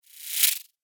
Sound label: Neon Flicker Whoosh; Whoosh Sound Effects; sound design